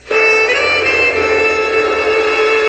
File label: ringtone